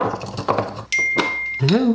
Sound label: Ding Hello